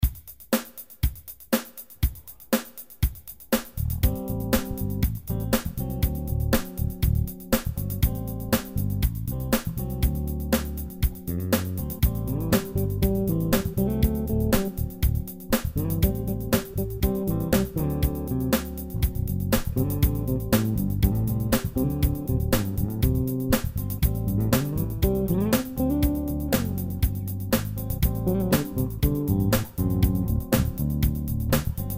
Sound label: jam